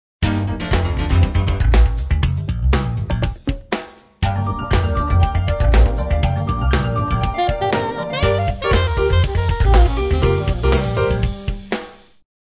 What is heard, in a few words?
music dry